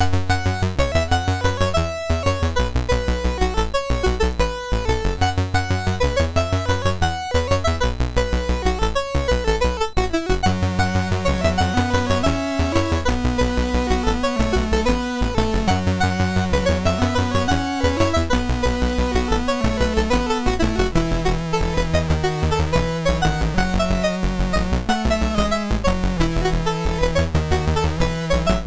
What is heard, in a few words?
MOS6581R2, sid; Pocket Rockets